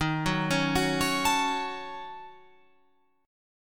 Eb Minor 7th Flat 5th, Guitar Open F; Ebm7b5 arpeggiated

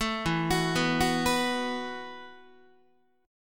E Suspended 2nd Suspended 4th E, down, Guitar; arpeggiated; JGuitar

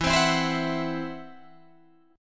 E Suspended 2nd Sharp 5th, Guitar, Drop D; 14,15,14,17,17,14

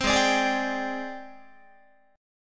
Ab Major Sharp 11th Ab down Guitar; AbM#11 strummed

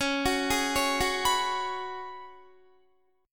Db 7th Suspended 4th Db down Guitar; arpeggiated

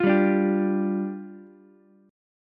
D Minor Double Flat 5th, down, Ukulele; Dmbb5; strummed; JGuitar

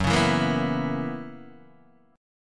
Gb 11th, Guitar, Open D

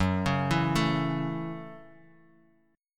Gb Minor 7th, Guitar Open D

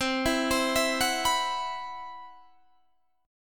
C Major 7th Flat 5th C, Guitar Standard; CM7b5; 20,19,22,21,19,19; arpeggiated; capo=2

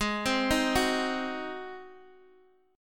Ab 7th Ab down Guitar Open D

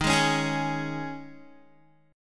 Eb 7th Suspended 4th, Guitar; Eb7sus4; strummed

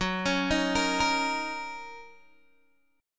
Fsharp 6th down Guitar Open G

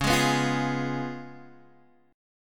Db 7th Db down Guitar Half Step Down

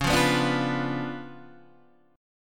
C sharp 7th Flat 9th Guitar Open D, chord

